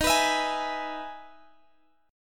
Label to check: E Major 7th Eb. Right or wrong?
Right